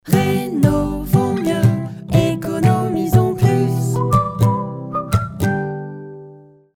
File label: jingle